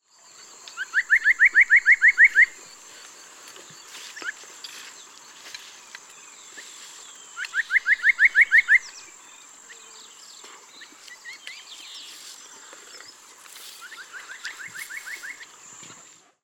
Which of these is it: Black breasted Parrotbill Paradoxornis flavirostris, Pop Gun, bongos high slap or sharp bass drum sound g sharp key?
Black breasted Parrotbill Paradoxornis flavirostris